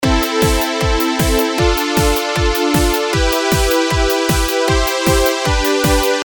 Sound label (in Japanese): パッド ノイズ無し